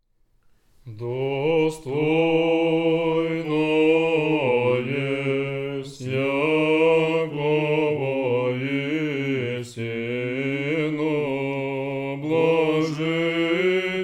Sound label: it is truly meet tone 3